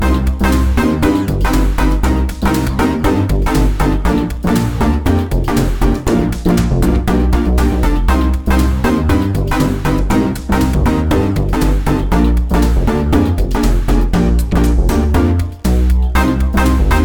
danger funny; Identity V

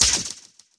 down shakers; gonner linux macos ps4 switch windows xbox one gamerip 2016

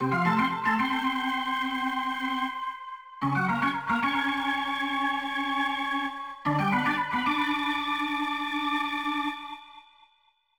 Organ; World Series Baseball 2K1; Dreamcast

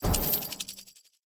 Lift Collision

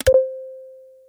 puzzle deactivation

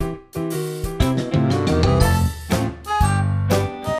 strolling music intro

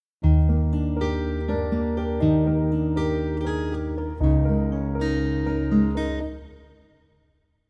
120bpm EbMajor

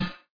Metal Impact

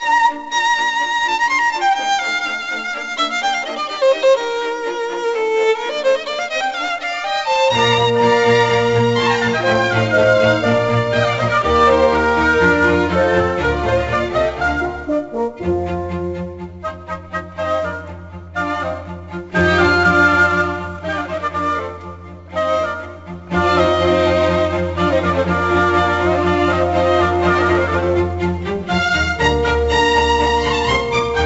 Music Intro; Lernerfolg Grundschule Musik Little Amadeus

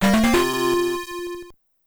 Missile Expansion Acquired; Metroid II Return of Samus Remastered

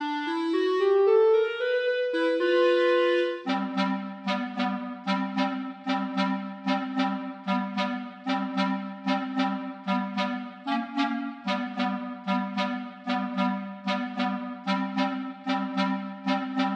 Underwater; Super Mario Bros.

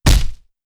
Punch

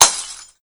Glass

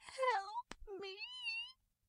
take rose; devour 2021 gamerip pc macos

